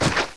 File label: build; Caesar III